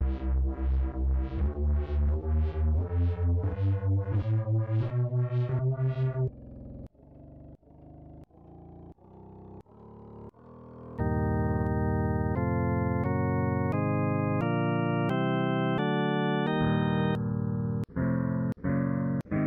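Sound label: C Melodic Minor scale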